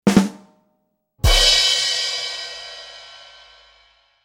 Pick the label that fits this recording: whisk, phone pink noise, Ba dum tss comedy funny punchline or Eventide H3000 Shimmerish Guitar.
Ba dum tss comedy funny punchline